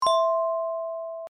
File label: new game; powerball evo